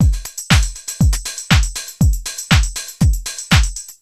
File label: High Energy, Drums Beats